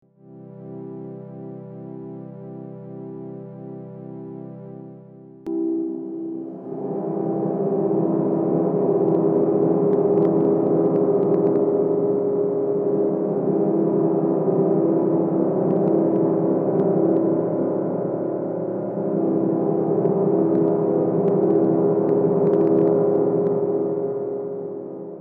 Dreadbox Hypnosis Federhall Fläche

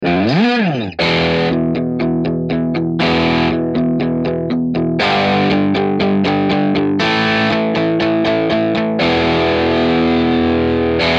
telecaster angezerrt

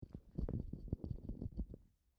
Hand AKG D7

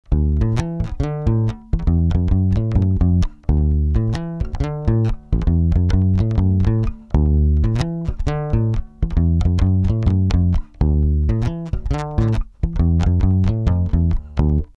Akustik Finger Tapewound Ultra Lo mid 3 boost; Charvel

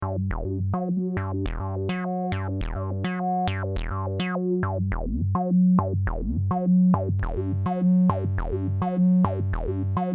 kleine seq moog

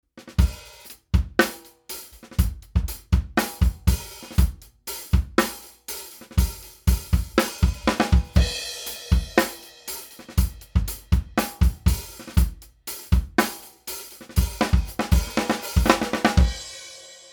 MPEQ 1 Drum Kit EQ mit Transformator; UnderToneAudio

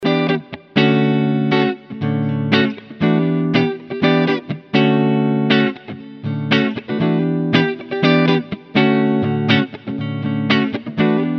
Les Paul Modern Hals Pickup Clean

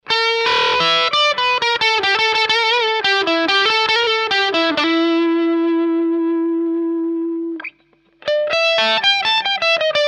Ibanez MH 400 Lead Zerre

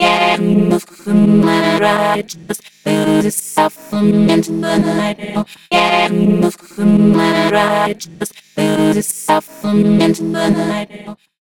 Orange Vocoder Pitch Q und Vocoder Freeze; Zynaptiq

